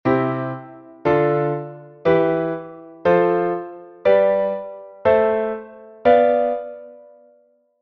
major mode; Essentials of Music Theory: Elementary

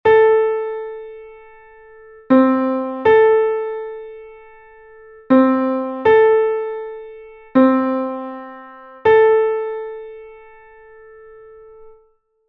major sixth; Essentials of Music Theory: Elementary

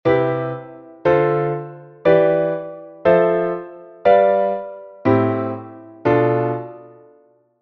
c major; Essentials of Music Theory: Elementary

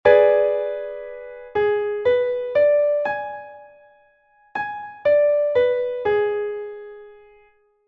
diminished triad; Essentials of Music Theory: Elementary

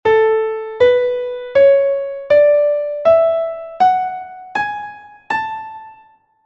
scale of a; Essentials of Music Theory: Elementary

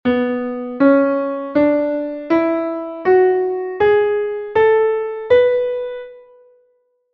scale of b; Essentials of Music Theory: Elementary

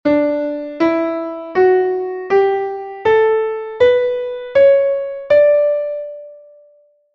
scale of d; Essentials of Music Theory: Elementary

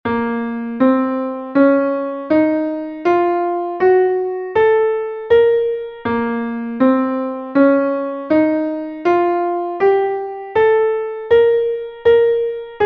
scale of b flat harmonic; Essentials of Music Theory: Elementary

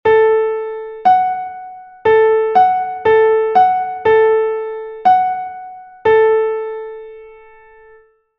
major sixth; Essentials of Music Theory: Elementary

